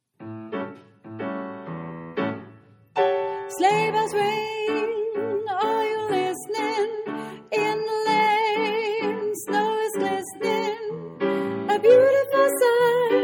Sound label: Walking Alt